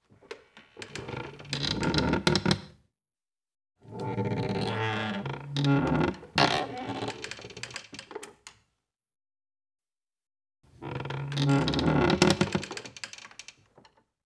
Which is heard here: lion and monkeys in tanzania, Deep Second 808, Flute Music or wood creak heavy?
wood creak heavy